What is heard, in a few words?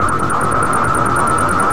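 ambient 138 e, atmos, Techno; atmo+fx-loops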